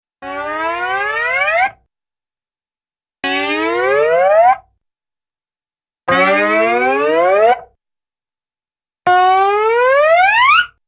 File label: Ascending Musical Stretch; Cartoon; Hollywood Edge; Cartoon Trax